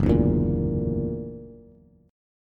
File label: Gb Augmented 7th Gb down Bass; Gb+7 strummed